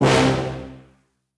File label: Stab C; KSHMR